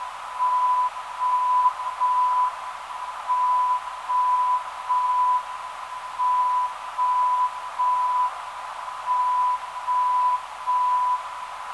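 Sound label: 360kHz, non-directional beacon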